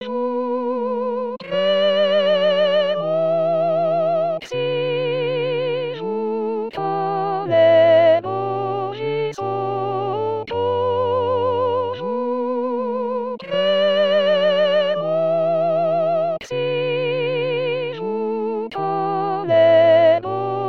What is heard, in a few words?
soprano